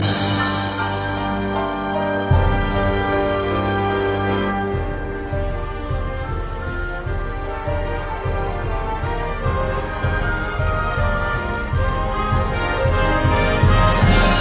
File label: battle orchestra